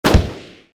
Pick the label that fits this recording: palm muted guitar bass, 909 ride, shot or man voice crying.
shot